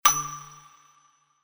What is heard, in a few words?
gold prize